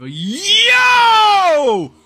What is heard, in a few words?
yeeeeoooooooooo yo